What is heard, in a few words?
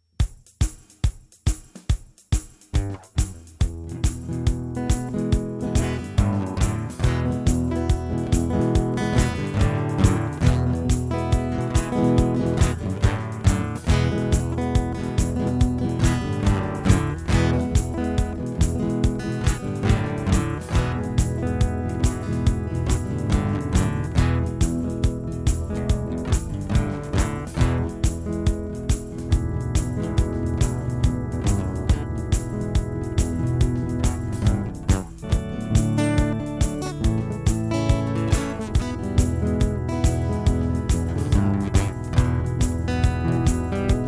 Acoustic Guitar